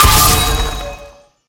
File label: trigger SFX; Heartsteel